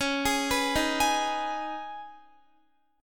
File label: Db 7th Suspended 2nd Db, down, Guitar; Db7sus2 arpeggiated; tuning=4E,4A,5D,5G,5C,6F